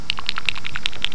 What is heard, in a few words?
Spinner